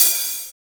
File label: OHH, HI-HATS